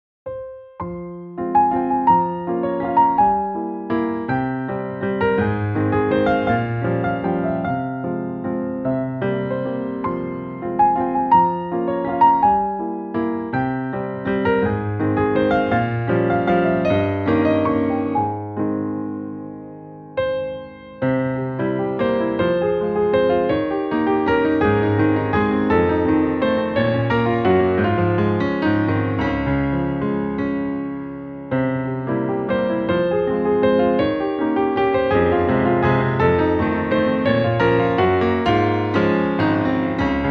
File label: waltz in a flat major